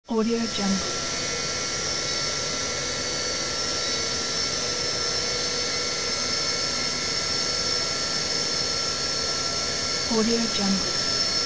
loud machine hum with high pitched whines; hollywoodedge

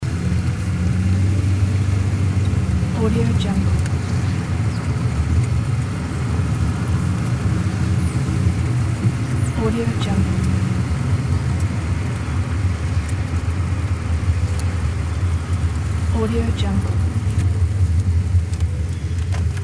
car driving ambience loop

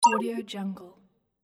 futuristic device button

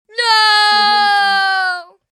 child yelling long no

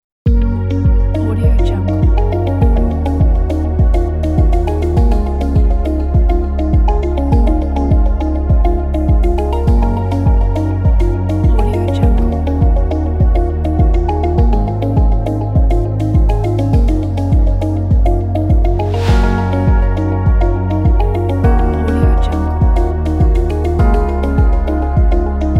ambient happy corporate

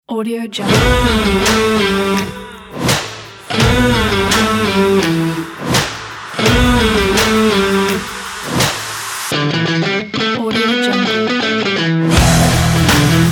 powerful rock trailer

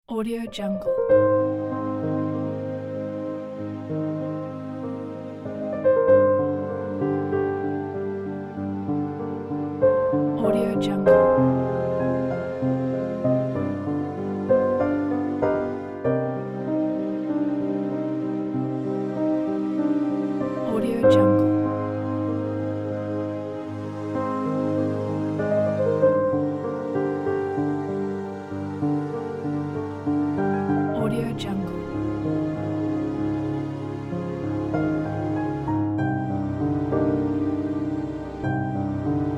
romantic piano

